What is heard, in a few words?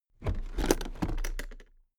toolbox open heavy